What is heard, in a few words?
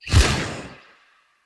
Charged Punch Whoosh; Weapons; Unarmed; Fey